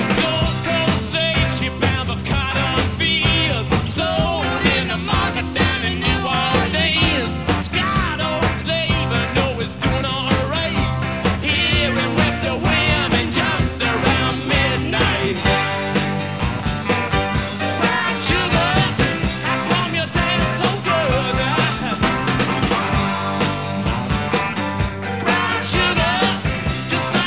rock

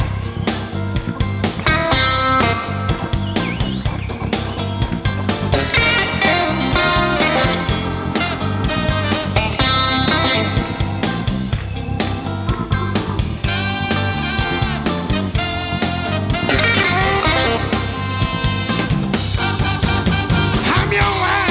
blues

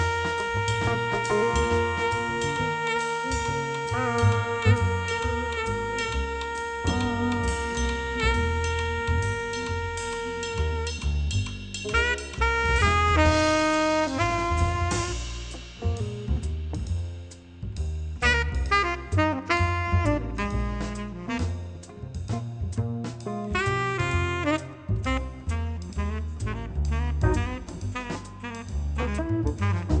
jazz

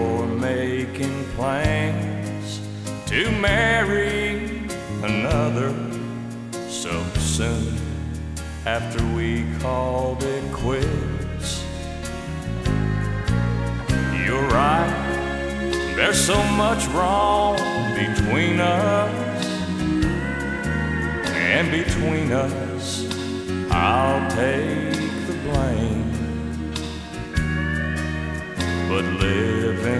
country

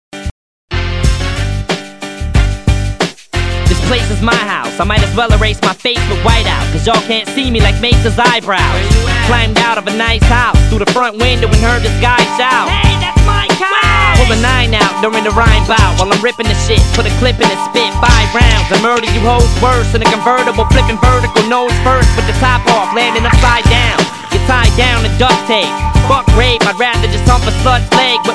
hiphop

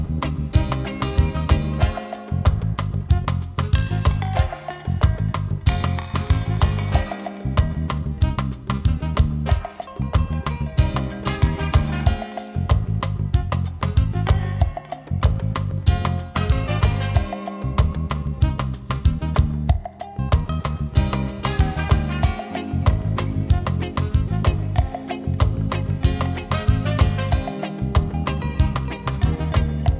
reggae

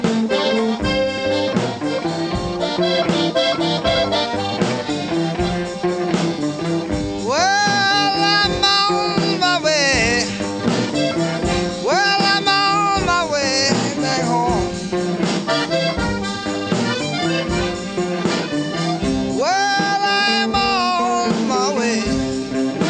blues

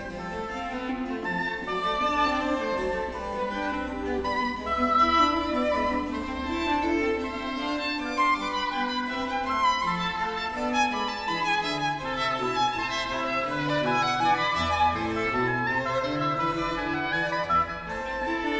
classical

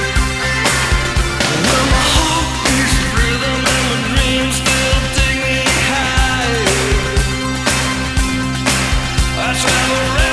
rock

